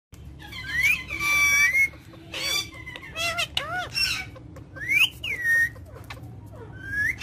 Macaw

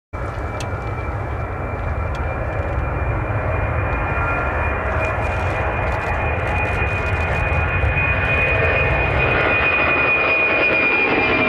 Airbus Beluga

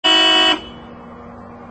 Hella, صدای بوق بنزی; بوق بنزی هلا

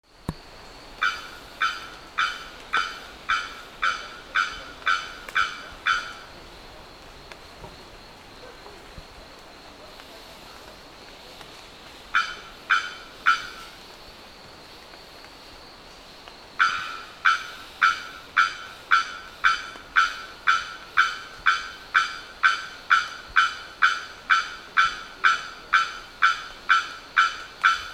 bearded bellbird

